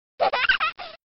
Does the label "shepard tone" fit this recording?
No